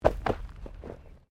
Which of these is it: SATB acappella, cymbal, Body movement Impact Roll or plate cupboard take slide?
Body movement Impact Roll